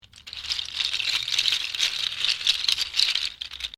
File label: Rattle seed capsules, Musical rattle made from seedpods